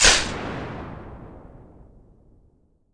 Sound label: drop; allegiance